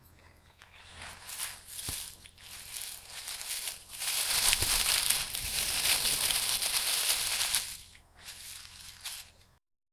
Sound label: harvesting a plant from t, farming